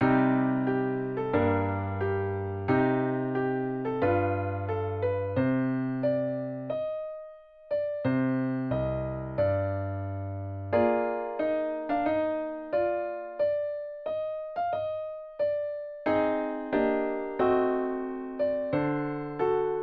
Marschartiges Stuck in C Moll fur Klavier; im Entwurf fast fertig geworden

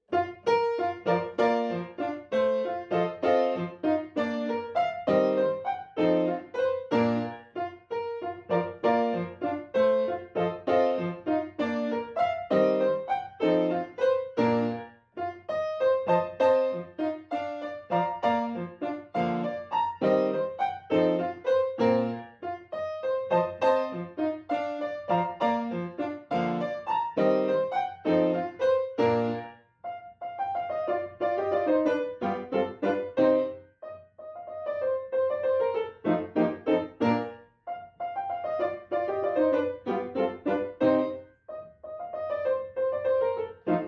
Deutsche Tanze fur orchester Klavierubertragung; Tanz 6

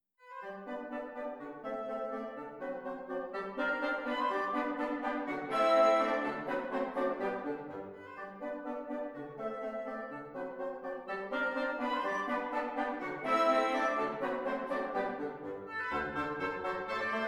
Ecossaise G Dur fur Militarmusik Fassung fur Harmoniemusik; von Gerd Prengel

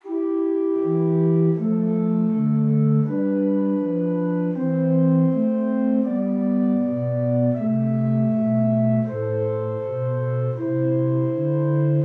Zwei simmiger Contrapunkt; Ronge; Correzioni di Albrechsberger

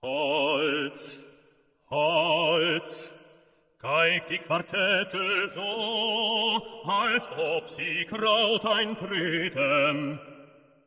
quartettspiel, D Mol; Musikalischer Scherz auf Carl Holz; Holz geigt die Quartette so

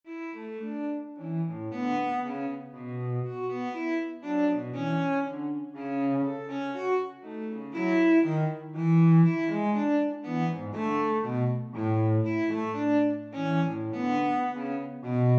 Kleines Stuck A Dur fur zwei celli; fur Samson de Boer

